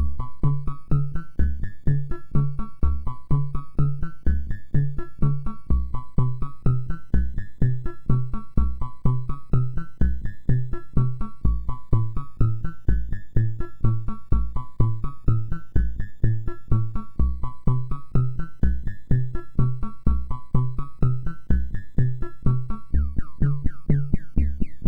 Yamashikoe sid MOS6581; hvsc

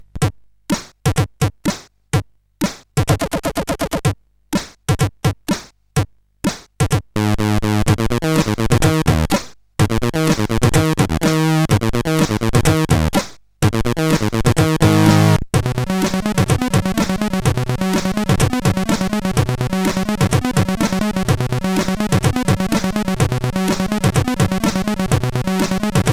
sid, CSG8580R5

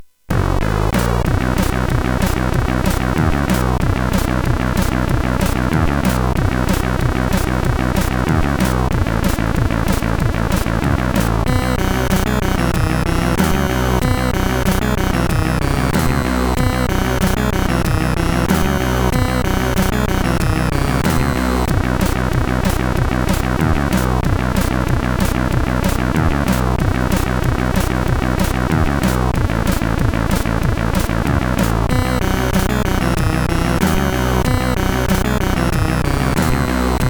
Folk Mix, CSG8580R5; sid

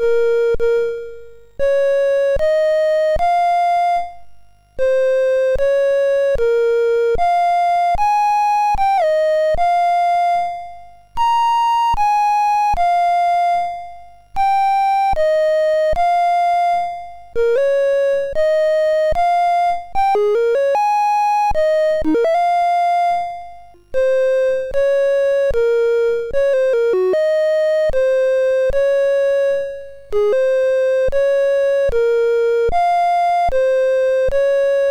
Monophonous, MOS6581R3; Cordis; Freqvibez; hvsc

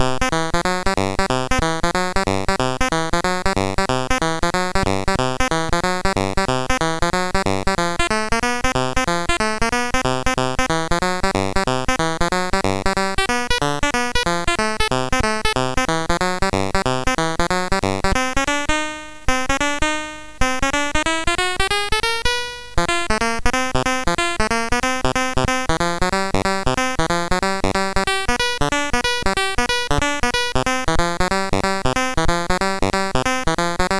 Chromatic Boogie BASIC, MOS6581R2

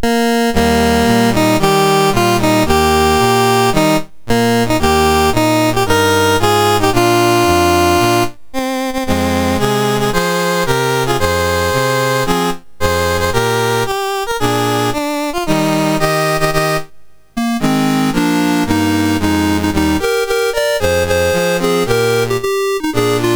Sao Tome and Principe, National Anthems; MOS6581R4; Dave Marquis

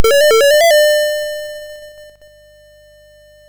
Buggy Boy, MOS6581R2

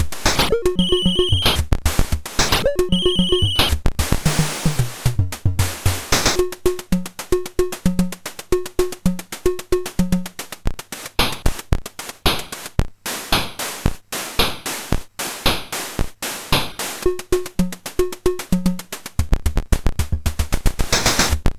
sid MOS6581R4

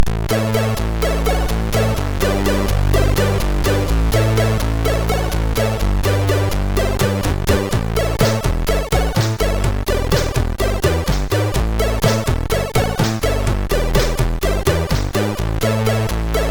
Not Funk Yeah, MOS6581R3; sid

